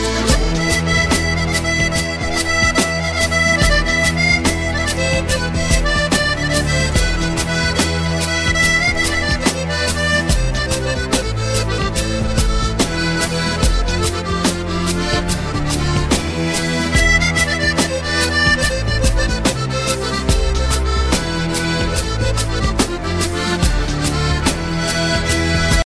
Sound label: CIRCASSIAN